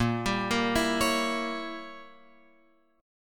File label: Bb Diminished 7th Bb Guitar Open F; arpeggiated; JGuitar